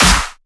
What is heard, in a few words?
SnareClap; FLPRO